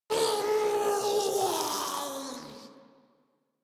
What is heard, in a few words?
Attack Roar; en; Warframe; Grineer; Ghoul